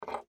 Object Pick Up, Foley, Object, Pick, Up, From, Table; Pick Ups; BlastWave